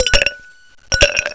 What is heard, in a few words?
ICE; Desktop Themes; Jolt Cola